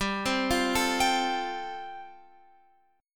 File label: G 7th Suspended 2nd Suspended 4th, Guitar Open F; G7sus2sus4 arpeggiated